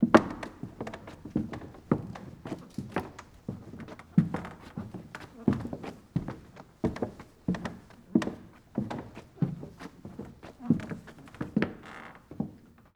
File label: Footsteps Wood; HauntedBloodlines; SFX Producer